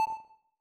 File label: One Beep